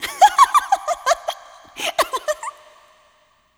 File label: laugh; Sonic Foundry (Sony Creative Software)